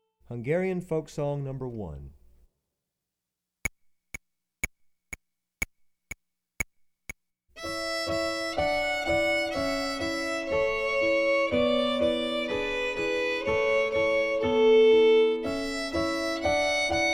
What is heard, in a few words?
Hungarian Folk Song